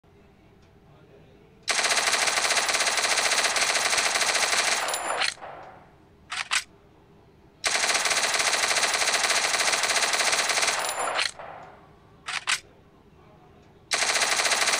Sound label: Thompson gun sound; free fire